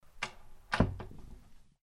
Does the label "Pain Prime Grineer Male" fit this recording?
No